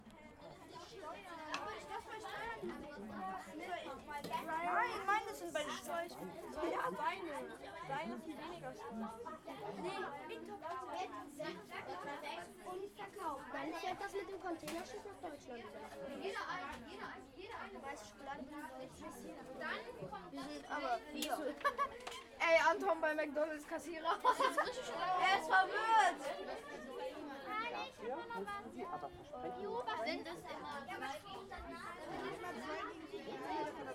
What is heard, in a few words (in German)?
Atmo, Sternsinger; Eschweiler; Sternsingermobil; 2025